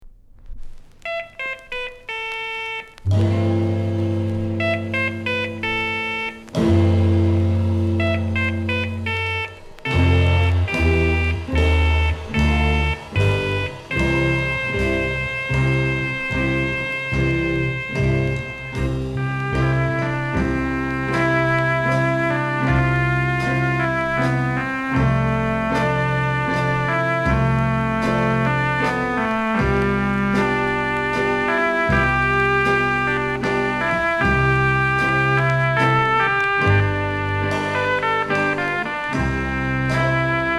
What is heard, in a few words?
PIANO